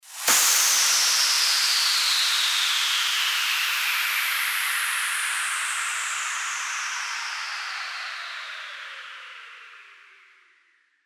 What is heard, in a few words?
WHOOSH